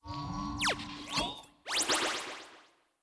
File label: Gacha Normal; blue archive